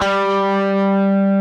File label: DIST G3, DIST GUIT